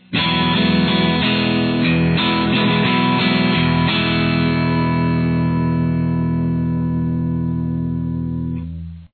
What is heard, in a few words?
E add 9, guitar